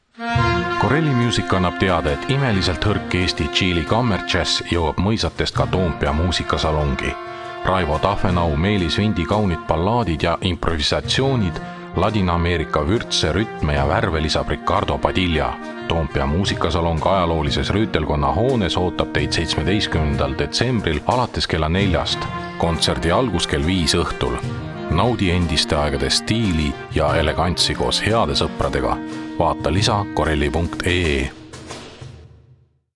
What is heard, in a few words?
jazz; toompea